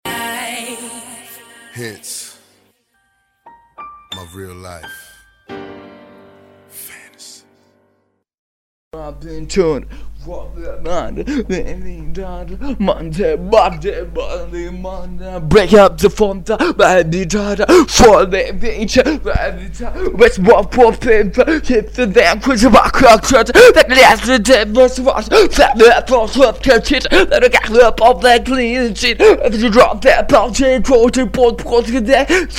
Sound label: Acapella